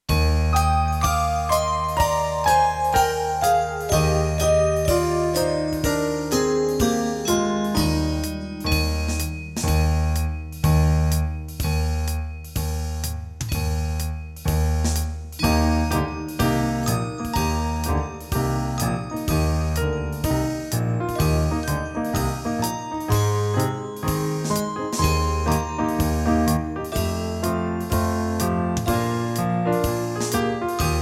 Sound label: Jingle Bells in Eb; christmas